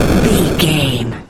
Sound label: retro explosion general